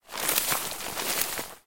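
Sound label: sneaking beast rustles leaves and tree branches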